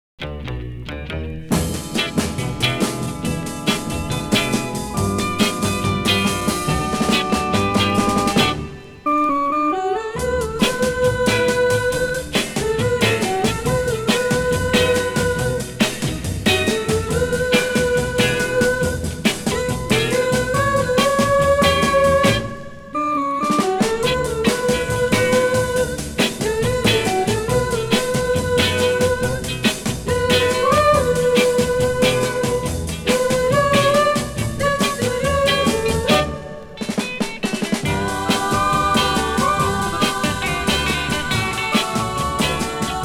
hully gully

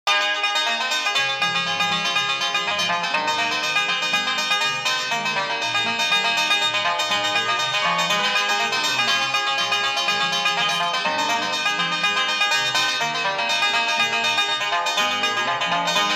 banjo harp

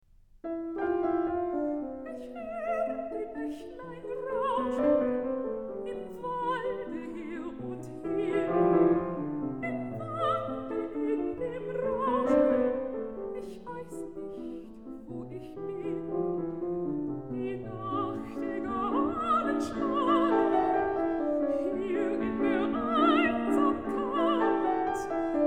songs for voice & piano; In der Fremde; Ich hör' ein Bächlein rauschen; Schumann; Liederkreis, Op.39

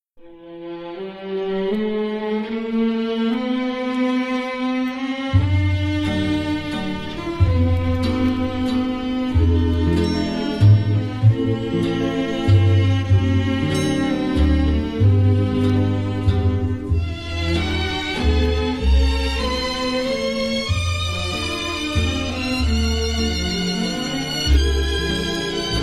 strings with brass; the spy who came in from the cold

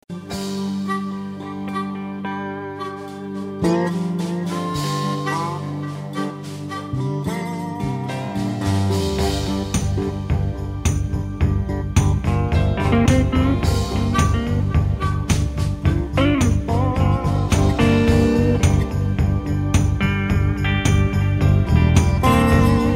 piano break

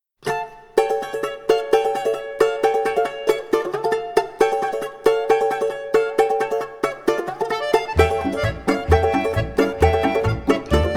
kvartet, karaoke

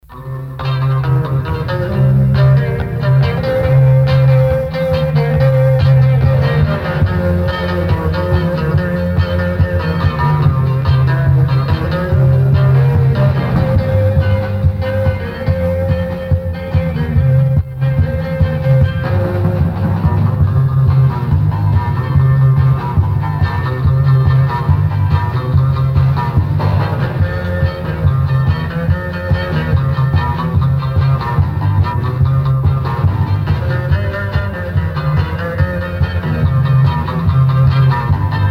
instrumental

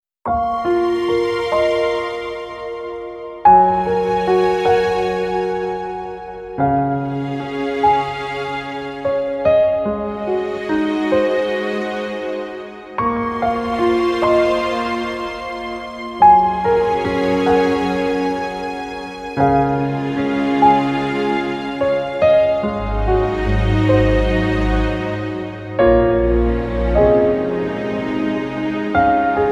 piano; Эмоциональное пианино

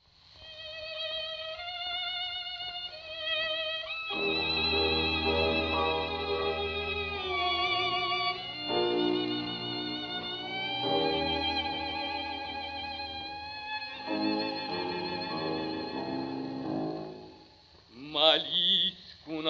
tenor

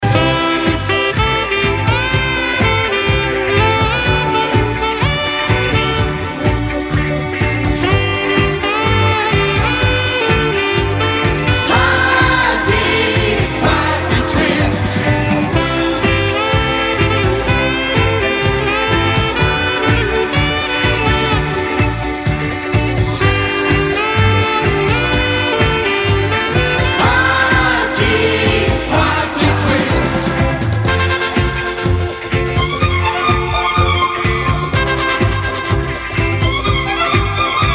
chor party twist

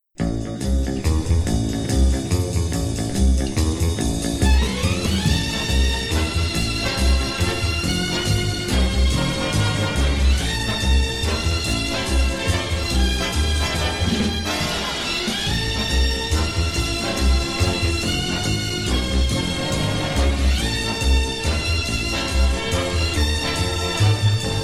strings

